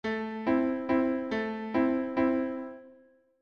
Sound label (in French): accomp mini-pompes la mineur